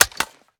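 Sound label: foley assault rifle akilo47 reload fast mag in; weapons; viper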